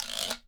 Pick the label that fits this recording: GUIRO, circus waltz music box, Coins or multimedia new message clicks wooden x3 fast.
GUIRO